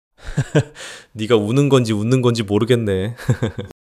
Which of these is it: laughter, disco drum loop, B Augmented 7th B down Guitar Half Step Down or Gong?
laughter